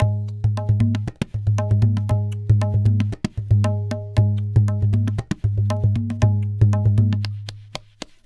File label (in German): tabla; instrumente